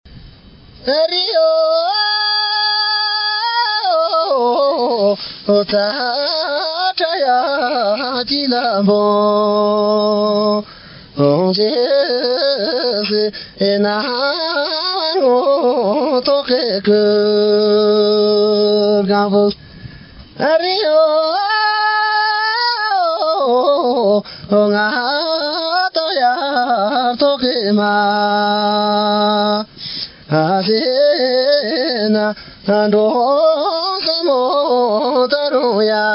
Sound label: Tibet Song